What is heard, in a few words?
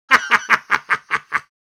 Old man sinister evil laugh